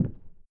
Out Side Foot Step

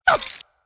Character Red Hit; angry birds epic android ios mobile 2014

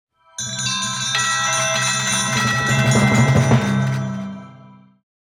Chest

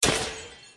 Click; the great ottomans strategy battle for throne android

